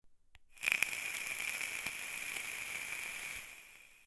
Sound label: ecig; Vapes; Zomboid; GnomeBar Vapes